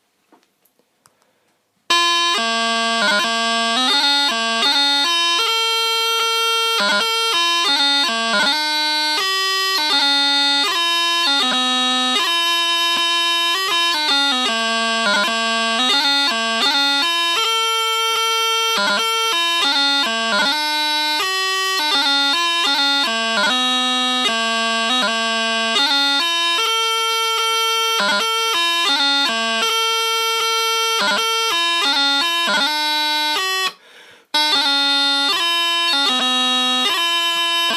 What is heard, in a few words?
Heavy Tone Practice Reed